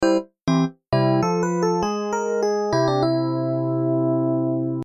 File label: Game over; mario